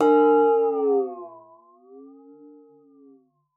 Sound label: WATER, PERC; Xsample VOL-9